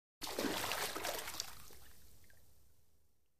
Swimming Water Move

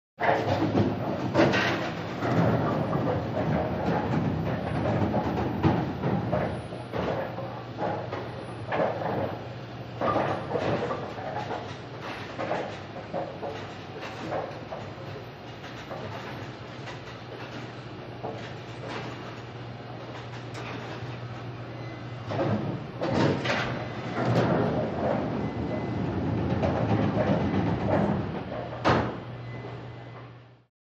Bowling Alley Ambien

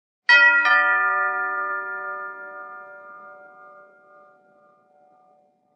Door bell, tubular bell chimes; CHIMES & BELLS DOORBELLS

